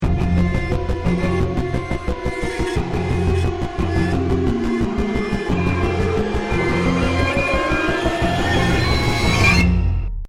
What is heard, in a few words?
round ten sec count, music